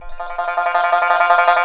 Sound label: SNXN TRML F4, Heart of Asia; Spectrasonics